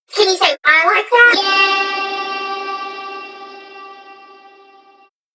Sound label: filler tool 128 G; Electro; filler tools; Anthemic